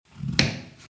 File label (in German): Zwiebel durchschneiden